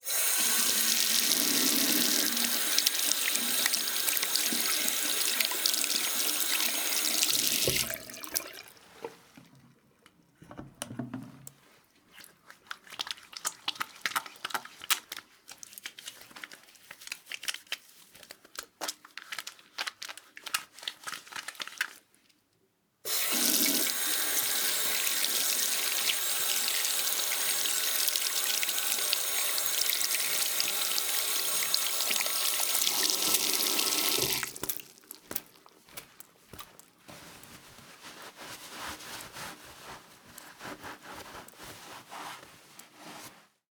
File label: Washing Hands